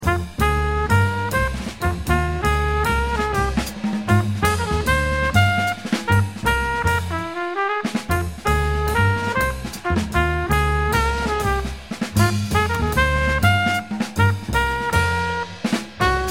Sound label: medium up minus Piano